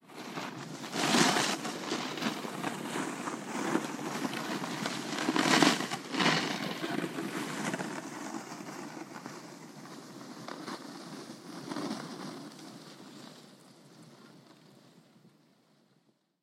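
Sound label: snowboard sounds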